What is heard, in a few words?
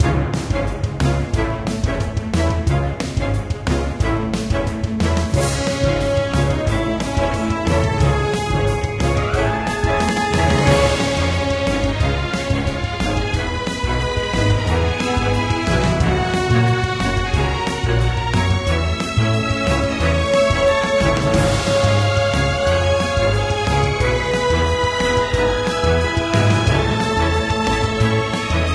battle music; EvoCreo; Android game music